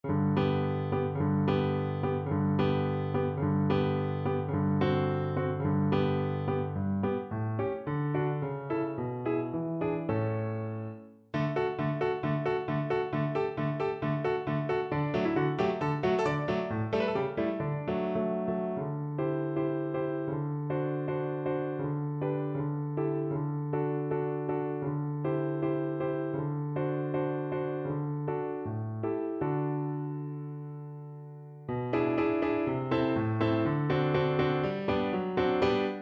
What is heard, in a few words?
vn pf; moniuszko; wesol i szczesliwy